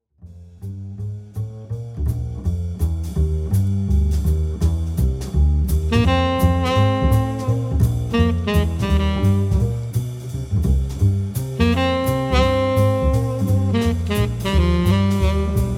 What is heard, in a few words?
Trio Sax Bass and Drums